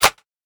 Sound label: flare gun barrel closed; tools